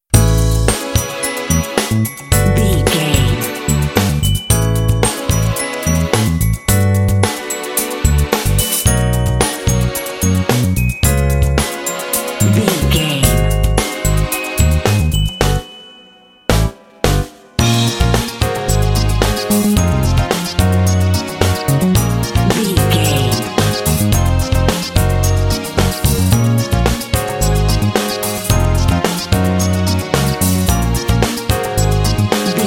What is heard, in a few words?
urbapop